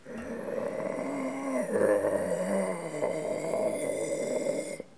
bruit animal

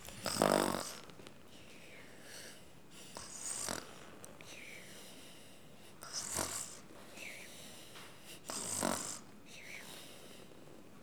ronflement

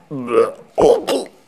vomir

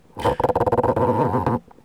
frottement

